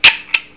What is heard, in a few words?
contaflex super bc